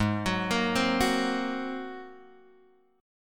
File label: G sharp 9th, Guitar, Open G